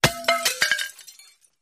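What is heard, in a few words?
Fluorescent Bulb Break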